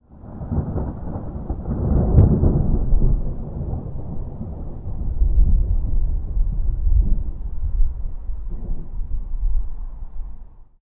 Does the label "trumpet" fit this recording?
No